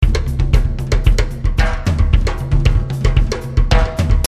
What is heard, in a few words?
Jungle Toms